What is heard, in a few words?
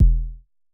Basic Kick E1, Dirty Kicks, Vermona Kick Lancet; LookIMadeAThing Kicks